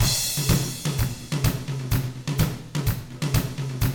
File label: 127 Drums